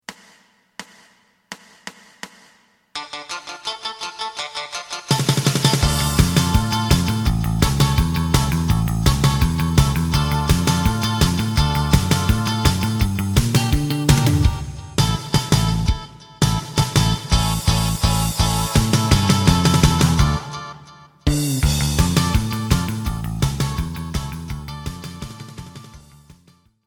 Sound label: lead